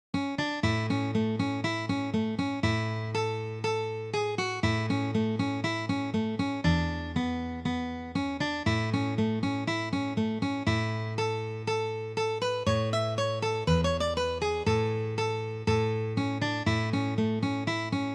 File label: the soldier s joy, Gitarre; traditional